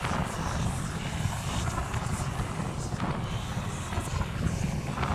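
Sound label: conjure boundweapon, magic; conjuration; skyrim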